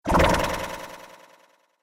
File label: Reverberating Spell; Magic Sound Effects